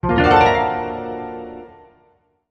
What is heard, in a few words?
Music Effect Synthe, guitar